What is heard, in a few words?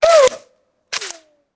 Bullet Pass By; Sound Ideas; Guns